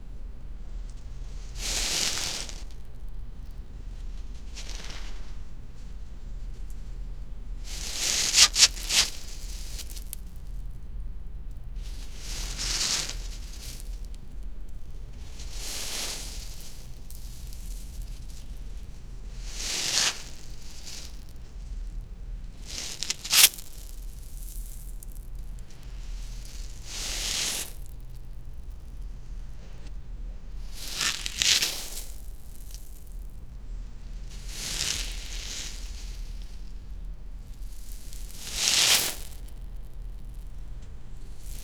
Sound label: sliding on sand, beach